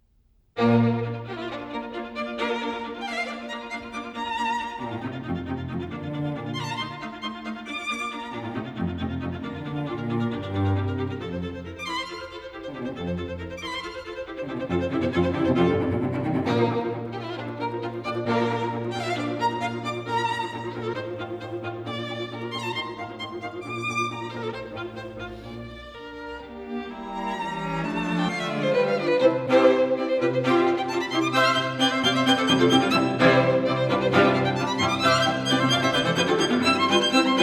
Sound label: String Quartet in B-Flat Major Allegro con brio; String Quartet No. 6; Op. 18 No. 6; Ludwig van Beethoven; Beethoven - String Quartets Nos. 1-16